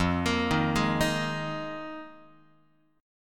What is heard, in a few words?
F Minor 11th, Guitar; Fm11; arpeggiated; x,1,1,1,0,2; JGuitar